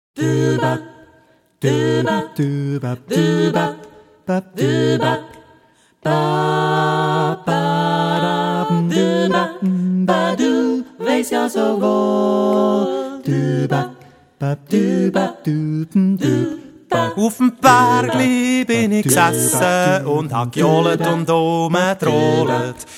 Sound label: blues